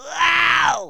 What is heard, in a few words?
punched; madman; Hellboy